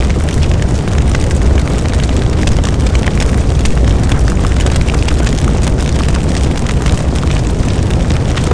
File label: burning; ambience